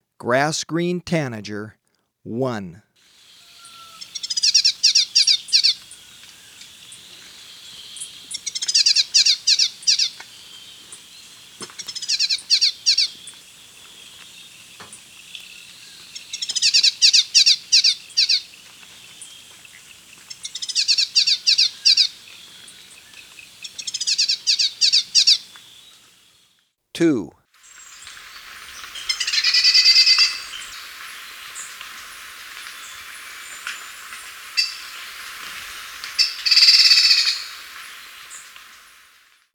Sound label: Grass-green Tanager, Birds of Ecuador; S. America